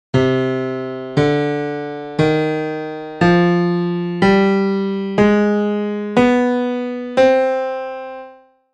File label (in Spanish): Escala menor harmonica